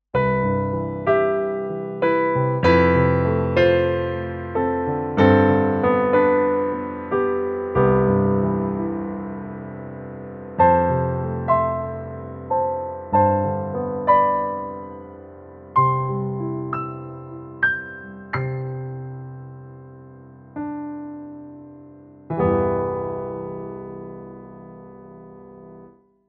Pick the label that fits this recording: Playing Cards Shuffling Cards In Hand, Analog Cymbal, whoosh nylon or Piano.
Piano